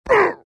axe pain; dota

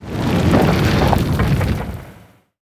impale projectile; weapons; dota; lion

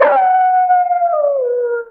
idle alt howl; movement; crystal maiden

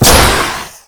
venomancer venomous gale

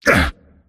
pain; pudge

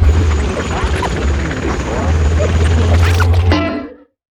taunt; tinker

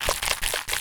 shake; dota; idles; tide

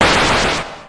bomb; spoonman; dota; crownfall

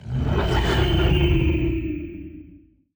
call of the wild hawk; dota; beastmaster

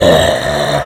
death troll common, creep deaths; dota

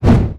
fissure whoosh; weapons; dota; earthshaker

